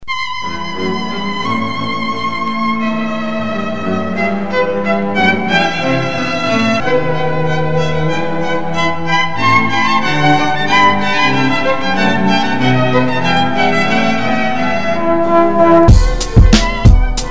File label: beat